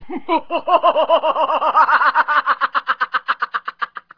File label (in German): Lachtyp